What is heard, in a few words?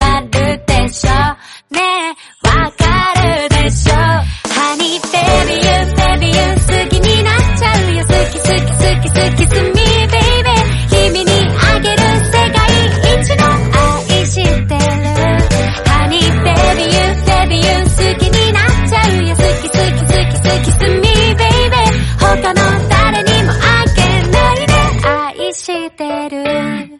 japanese love song